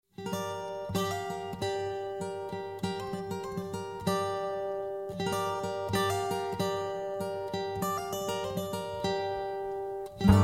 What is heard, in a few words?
chorus